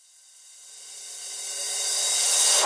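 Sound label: drum crash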